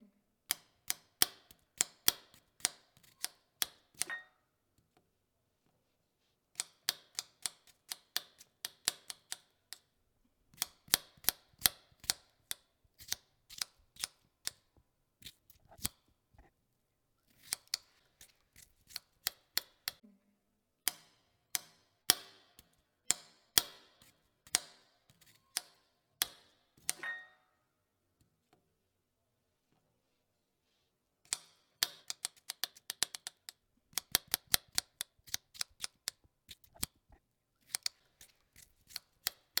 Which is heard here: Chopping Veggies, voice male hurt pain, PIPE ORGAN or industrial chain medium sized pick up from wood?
Chopping Veggies